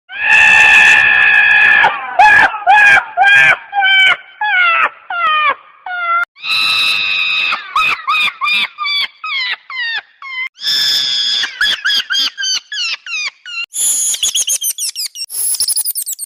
rzhanie

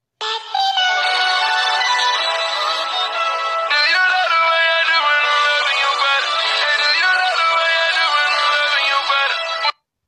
fonovaia muzyka dlia video

